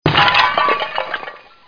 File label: toilt brk; TNP demo